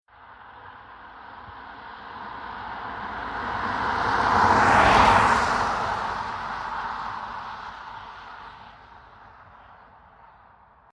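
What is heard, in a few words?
Car Saloon Passby Single Medium 40MPH Wet Asphalt; Transport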